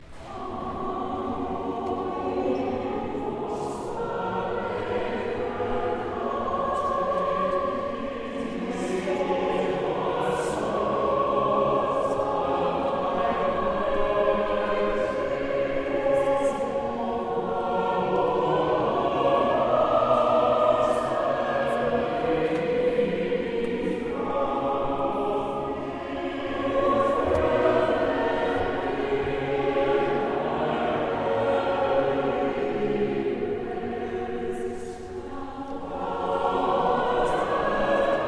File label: Communion motet